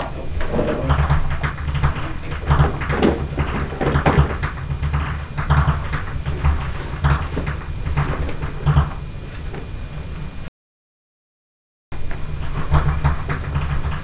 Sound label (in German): schnalzen, jugend